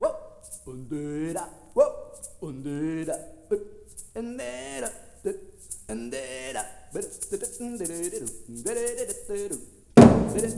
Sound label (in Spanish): Orquestra, Mariachis